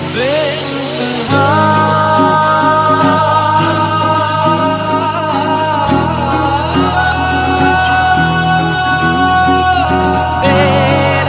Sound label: Remix Songs